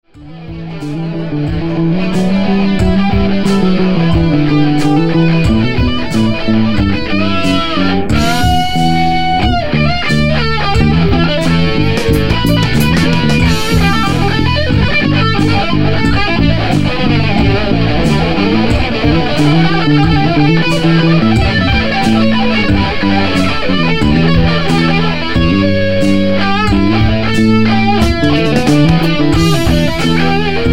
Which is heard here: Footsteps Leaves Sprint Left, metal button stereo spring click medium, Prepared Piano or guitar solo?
guitar solo